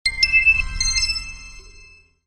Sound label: levelup; TerranQuest